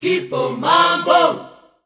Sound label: F 100, Voices Of Africa Sung Phrases; Kipo Mambo